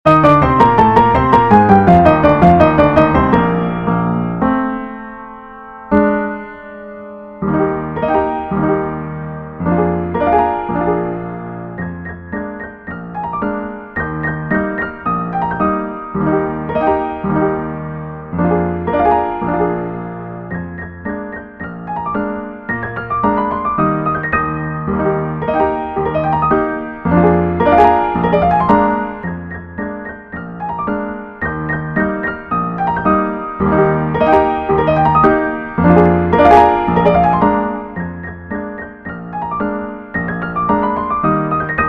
piano, sheet music

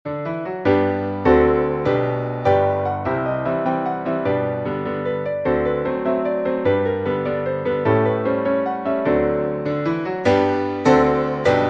PIANO

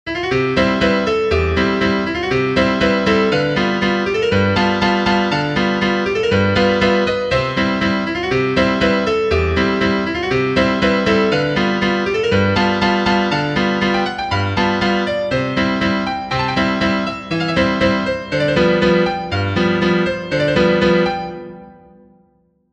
piano, sheet music

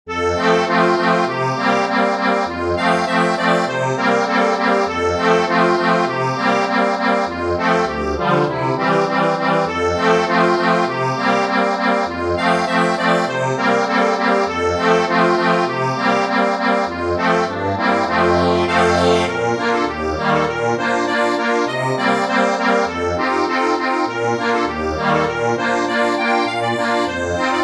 Westminster Bells accordion

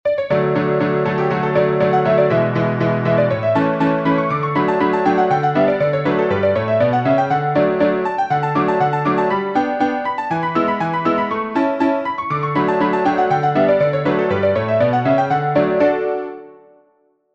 Hornpipe piano; College

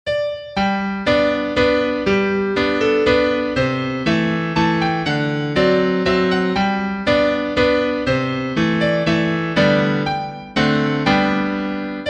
PIANO

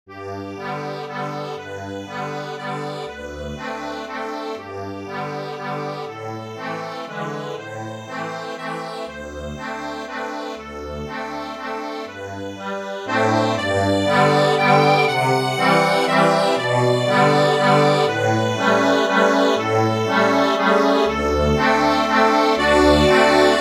ACCORDION

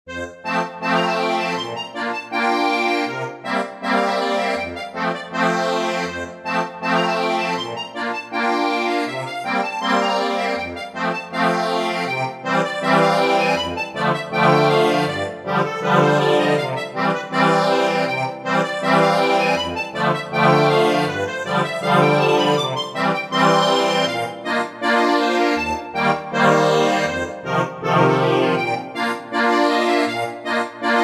Polka acc

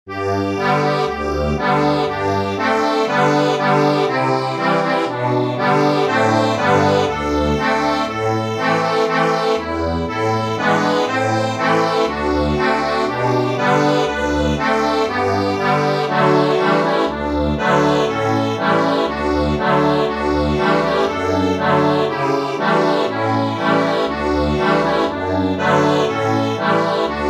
accordion

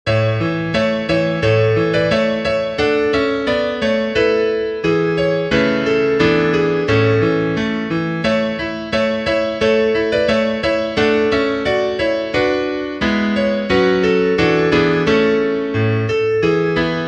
piano, sheet music

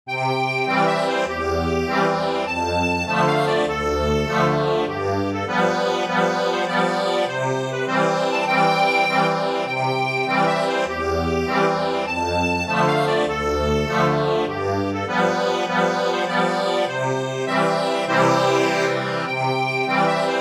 accordion, sheet music

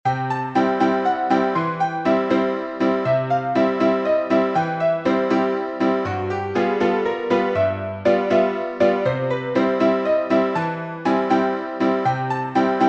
PIANO

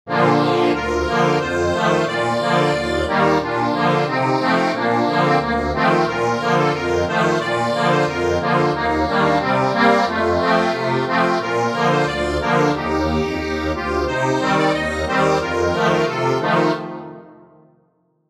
accordion

